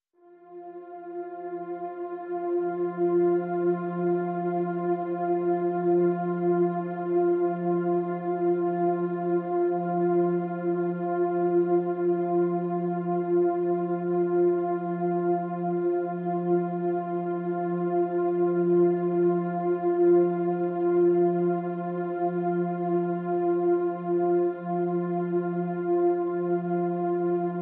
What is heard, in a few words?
Pads Ethereal Mist (F)